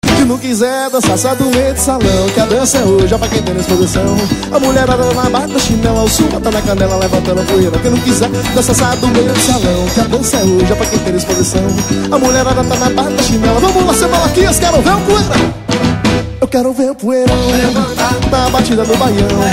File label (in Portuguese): forró